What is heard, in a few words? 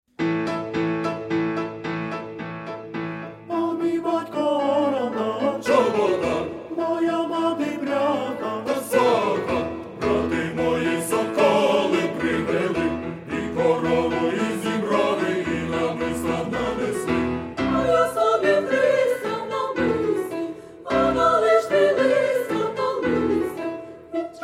instrumental